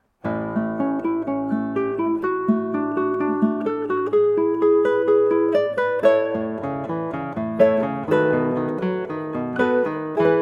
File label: Prelude, Guitar and Ukulele; Prelude 2 (1st short preludes BWV 939); Johann Sebastian Bach